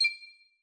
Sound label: back, interface sfx; obsydianx interface sfx pack 1